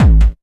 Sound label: KICK hardcore, Techno Trance DRUMS